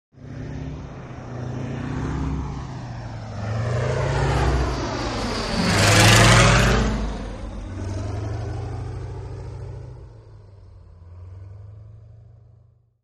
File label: Stearman By throttle